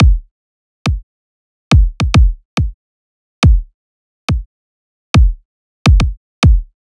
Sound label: Riddim Drum Loop 140 Kick